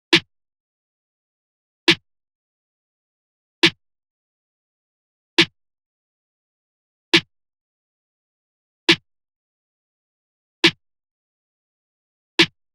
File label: Snare 137 Bmaj